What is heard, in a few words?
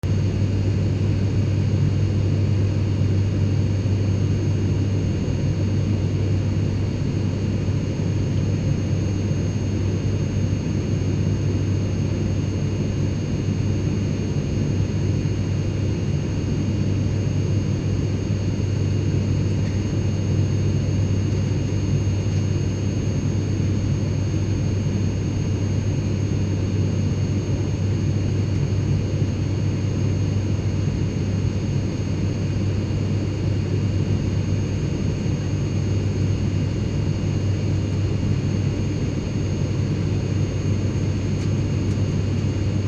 airplane interior